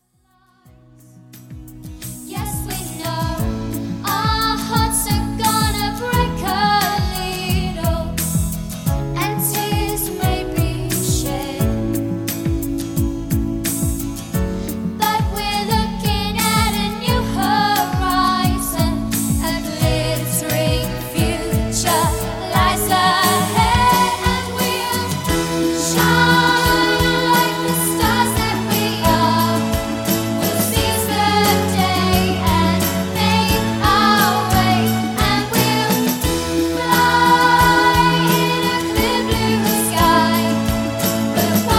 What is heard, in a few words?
with vocals